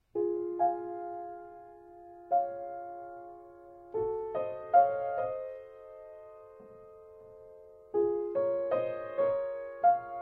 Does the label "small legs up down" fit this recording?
No